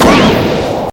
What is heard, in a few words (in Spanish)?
humo; jutsus